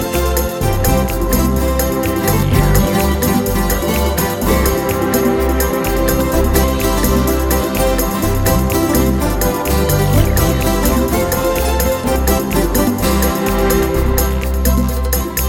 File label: Fast and Beautiful